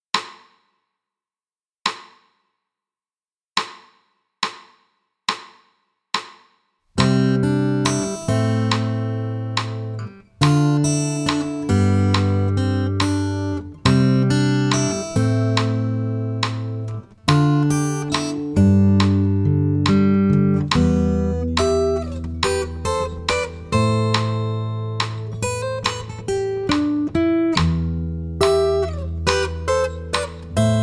solo g

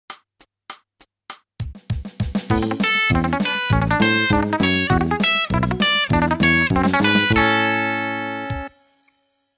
country

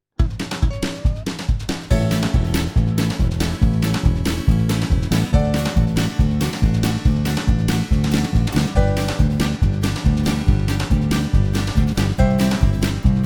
karaoke

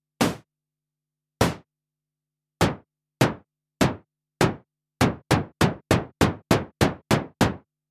synthe

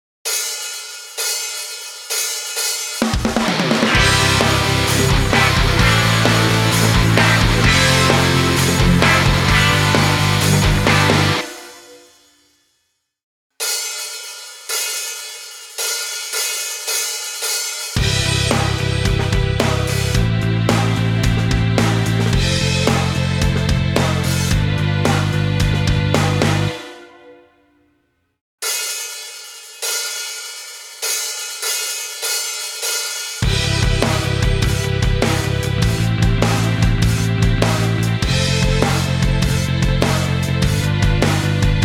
karaoke

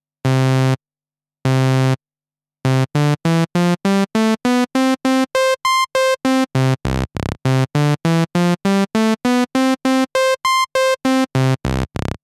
Saw, synthe

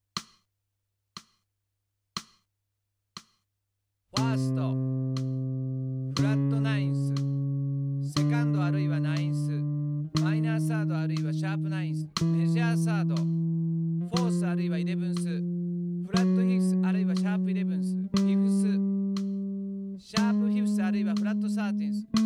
jazz

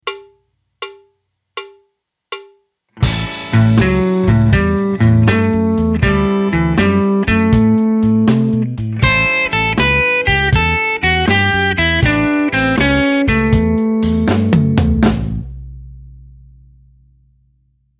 blues

